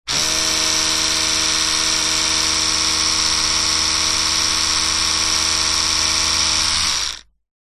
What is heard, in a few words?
household electric toothbrush on run off close up; zapsplat